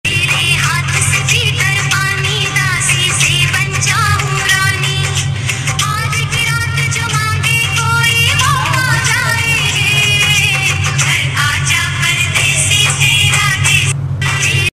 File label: karva chauth special ringtone